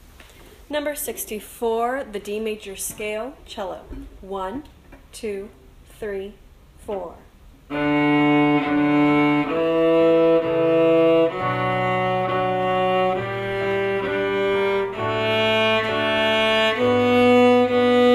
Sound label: cello